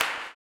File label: CLAP, Blues Soul; Spectrasonics Vocal Planet